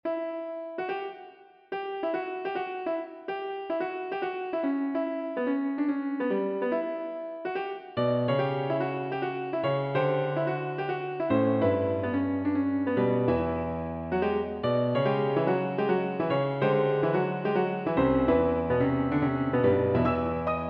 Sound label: Piano Four-hands